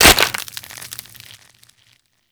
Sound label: Infested Dart Pistol Mech; Warframe; Weapons